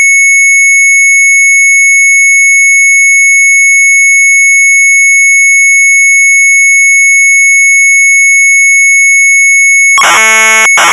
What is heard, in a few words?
MZ800 Tape; sharp; CIRCUS STAR; retromelsarchive